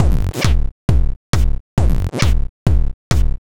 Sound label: Mixed Beat; Sonic Foundry (Sony Creative Software); Afterhours EDM; Session 10 - Come In Hard